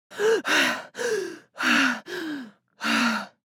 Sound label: Woman breathing exhausted tired